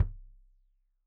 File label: Kik Stereo; Deeply Sampled Drums